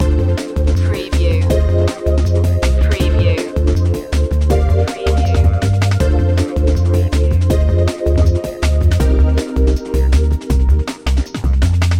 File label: town beat loop